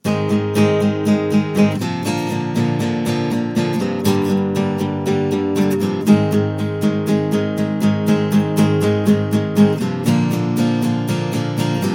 przedrefren bez tlumienia gitara

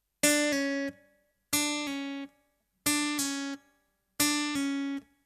pull off d cis, gitara

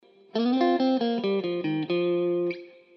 zagrywka blues wejscie na bridge zagrywka C F, gitara

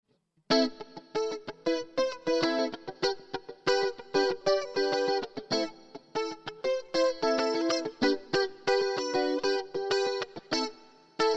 rytm samby dzwieki pelnej skali, gitara

